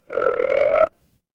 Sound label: animal frog